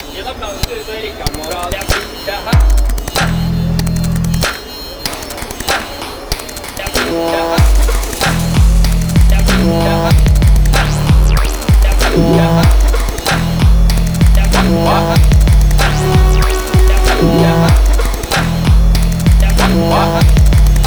beat; thegathering18; fast music

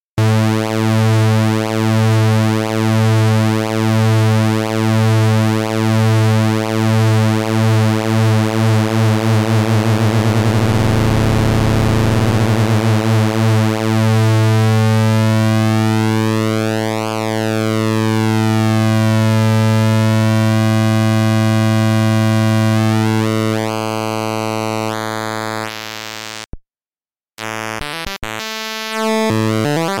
pulsewidth modulation

